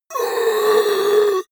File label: monster inhale; kreaturen